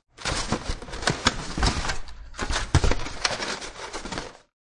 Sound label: Styrofoam Sounds, Squeaking and rustling of styrofoam package; Crunches; Objects